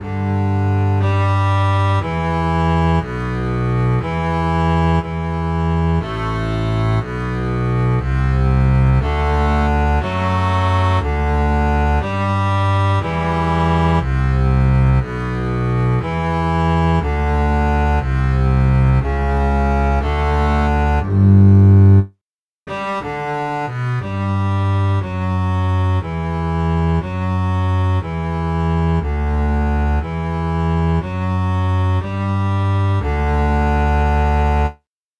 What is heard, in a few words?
contrabass